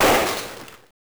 Wood Break; Genesis

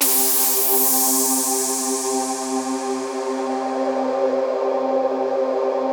VOICE PAD; Masterbits; Vintage Voltage

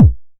909 BD; Goldbaby Tape808 & Tape909; The Tape 909

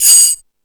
Rap Session, E-MU Pro-Cussion

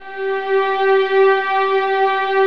VIOLINS, Synclavier Strings; ILIO

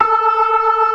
ORGAN, C3 NO PERC; Keith Emerson Signature Series

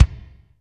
Kick, Alesis DM5

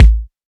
kick A, hip-hop drum kit; 99Sounds x Monosounds; Moon

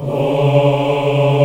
AFROAH C#3, AF.VOWELS; Spectrasonics; Heart of Africa 1

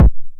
909 BD Tape Sat

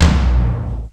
Fairlight CMI III Tom Lo, Drum Machines; 300 Drum Machines

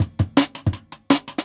Jungle Breaks

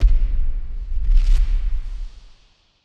Hit Splosion; musicradar; bomb factory

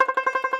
Tenor Banjo, Plucked Strings

